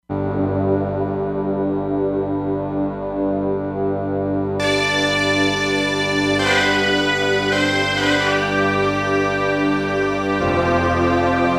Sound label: Dali Drone, E-mu Proteus-3; Factory patches